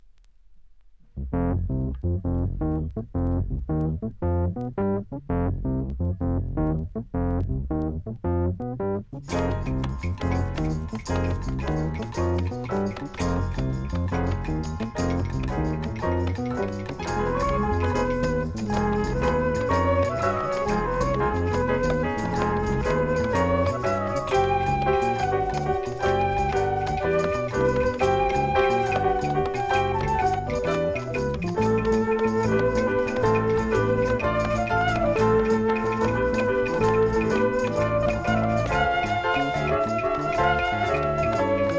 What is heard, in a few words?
PIANO CHOIR